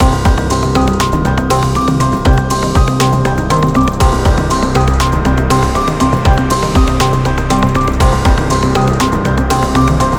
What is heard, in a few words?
tension violin; gerilim; By Burak Sound Bank; tension vol 18